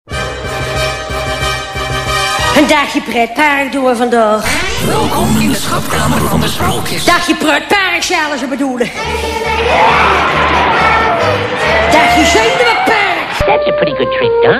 jingle; Kermis Jingles Diversen

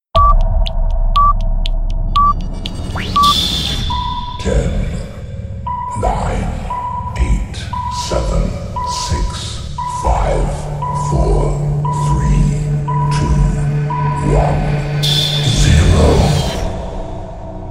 Peep Countdown, Kermis; Kermis Aftel Counters

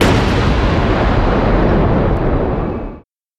Scarry effect; Spookslot Tunes Effecten

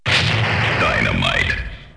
Dynamite; Kermis Jingles Diversen

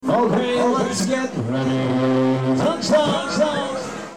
jingle; Kermis Kassa Diversen

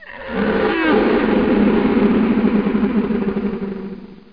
monster; Spookslot Tunes Effecten

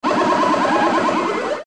jingle; Kermis Kassa Diversen

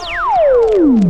Pieeeew; Sound Effects Deel 1

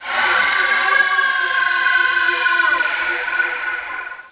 monster; Spookslot Tunes Effecten

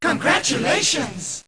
Congrats, Kermis Jingles; Kermis Jingles Diversen

